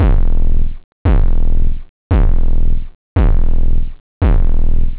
terror, drums techno; OctaMED SoundStudio V1

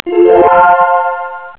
Win Game; Réflexion; Mac Mine Sweeper 4.0

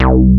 minimoog; 16bitsamps

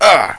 agh; FRACAS 1.2.1; Fracas Sounds

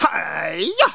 ATT; Creative Review CD-ROM 28; kungfu

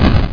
GOT HIT

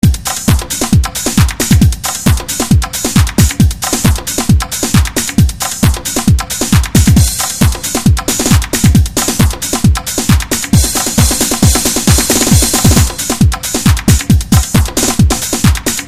drums loop vysledok; doplnen² o obΦas pomno╛en² dupßk vystrihnut² z p⌠vodnΘho rytmu a ╣kopok z toho istΘho rytmu

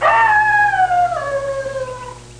Wolf

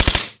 MP5sd shot; SpecOps2 MPlayer Demo

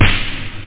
drums snare; OctaMED SoundStudio V1

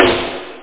radio snare

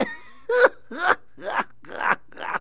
laugh; BESTSHOT; noisybit

